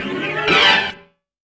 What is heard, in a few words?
Yamaha CS1x Drums